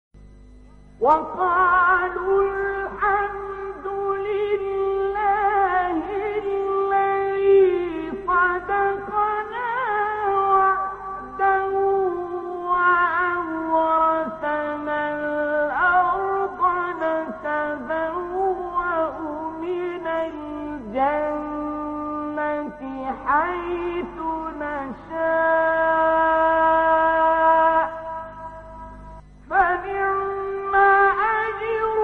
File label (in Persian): تلاوت آیه سوره; زمر; استاد عبدالباسط